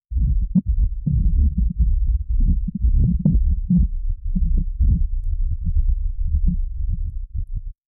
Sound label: sudden silence followed, low strings